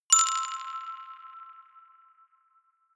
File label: notification glockenspiel rattle third